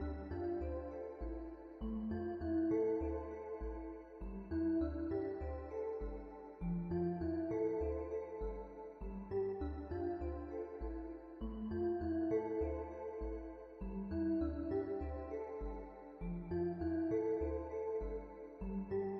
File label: Music Ambient; MiSide